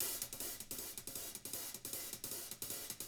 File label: HIHAT LOOPS; Living Drums